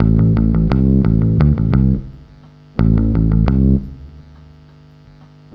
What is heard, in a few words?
BASS, BASS GTR